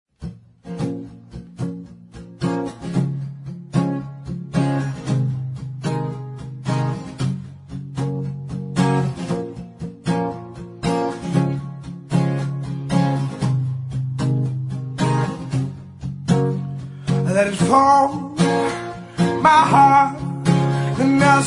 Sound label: Blues Jazz